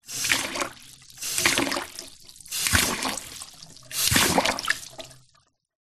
household glass bowl full of water kitchen faucet tap on short bursts into; zapsplat